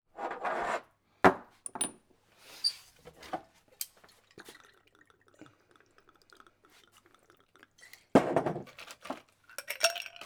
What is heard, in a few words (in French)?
BAR BARMAN RAPIDE SERVICE BOUTEILLE VERSE ALCOOL COCKTAIL COMPTOIR